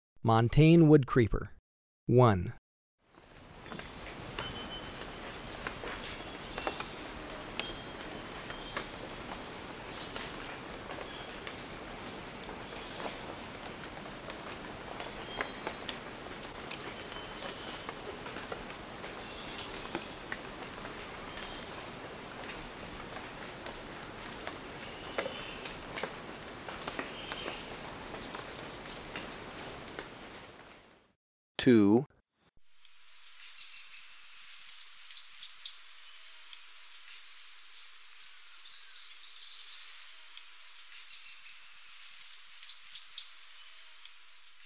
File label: Montane Woodcreeper, Birds of Ecuador; S. America